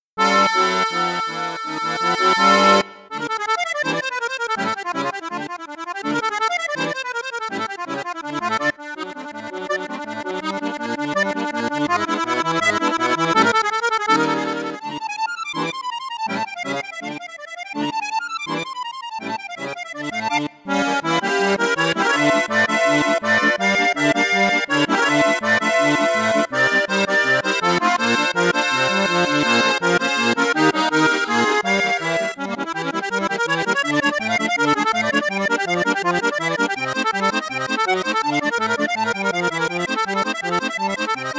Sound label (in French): registre flute juste flute haute, accordeon; piermaria 315